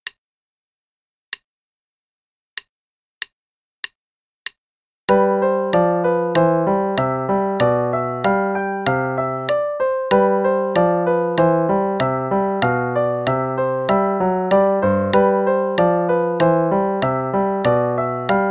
Sonatina in C Major (qn=96); Play-a-long; Latour

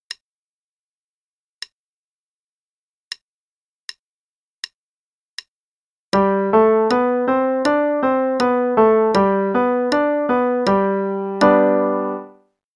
G Major Pattern (qn=80); 5-Finger Pattern; CM Level Prep; Daily Warm-ups

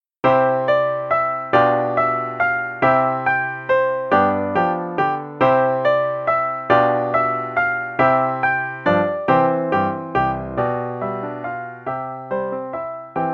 The Handbell Choir (no click, both parts, qn=140); Level 1 Performance - page 20

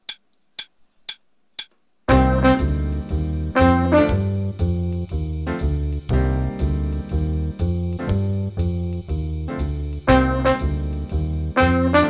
Improv Cmin with Jazz Rhythms (with trumpet)

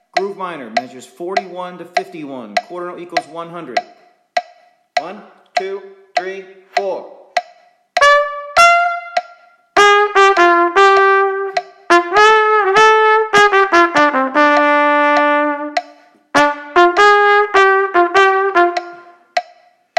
Play-along, Groove Minor, qn=100, trumpet

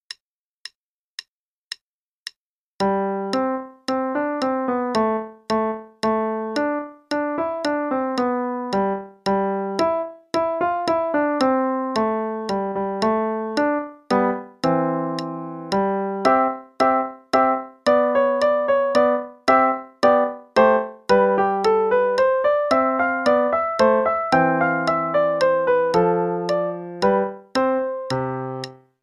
We Wish You A Merry Christmas (Baroque, qn=112); Play-along; Faber 4

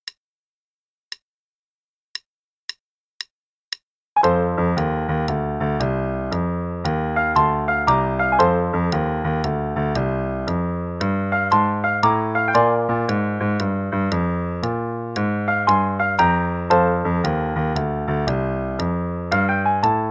Walkin' Bass, qn=116; Level 3A - 2nd Ed; Cool

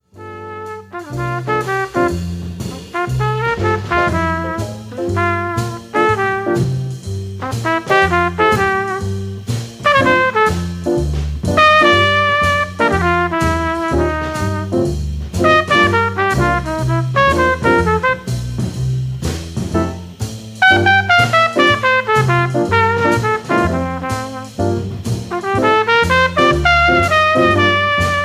trumpet solo only

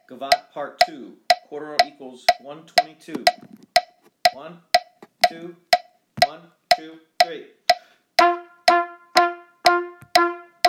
Easy Duets, Gavotte, qn=122, trumpet

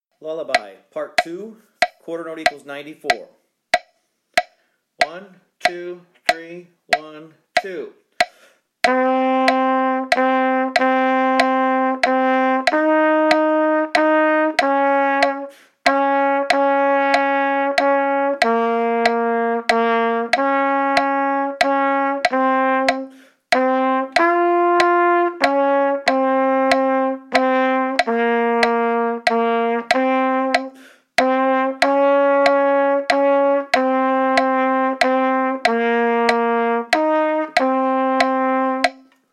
Easy Duets, Lullaby, qn=94, trumpet

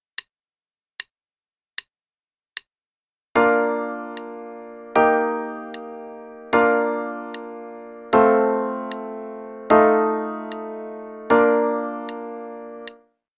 Bb Major Chord Progression only (qn=76); I - IV - I - V - V7 - I; use the common tone with legato pedal; CM Level 4; Daily Warm-ups

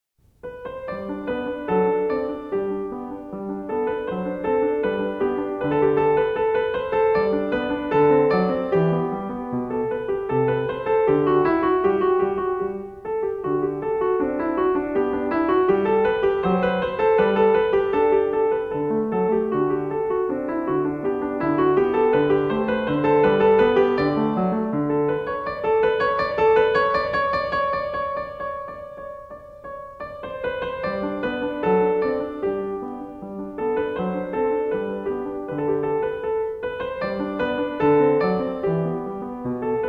Sonatina In G Major (Allegro); Magrath Book 03

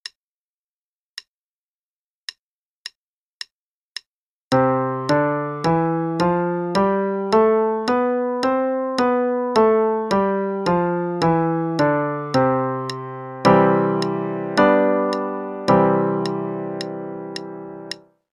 C Major Scale and I-V-I (qn=108); CM Level Prep; Daily Warm-ups

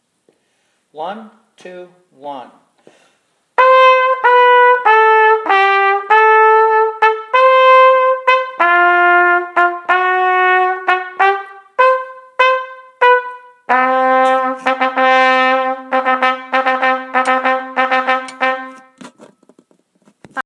Marche Militaire

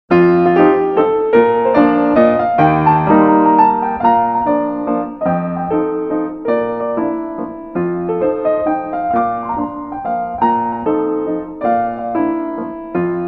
Mazurka In Bb Major; Marlais Book 6

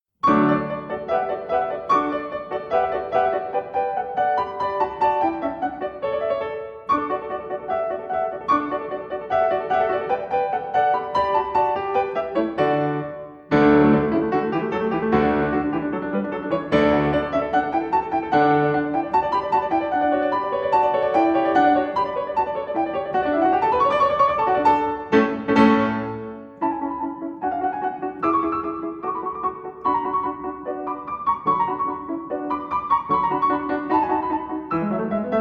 Piano Sonata in D; Haydn; No.50, Hob.XVI 37 (first 1-30)

